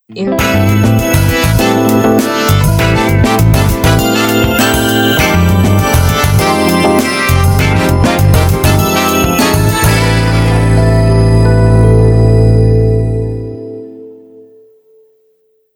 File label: Band Hero